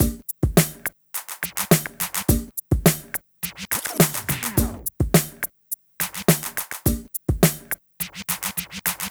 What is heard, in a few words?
Club Tip, R&B Drums; Sonic Foundry (Sony Creative Software)